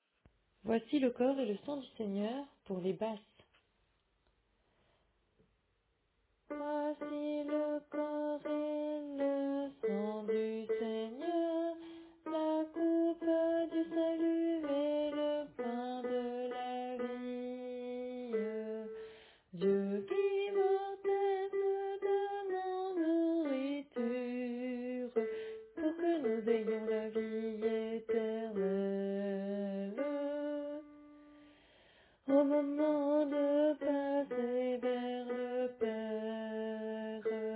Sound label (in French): Basse